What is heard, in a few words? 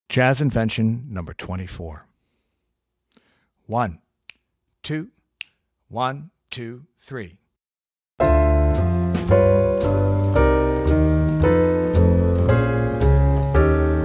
Jazz Invention No. 2